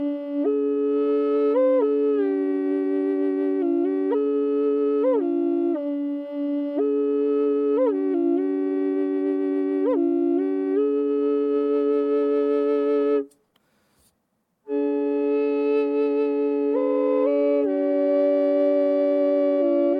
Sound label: Triple no Effects, native american triple drone flute; nussbaumholz; premium indianerfloete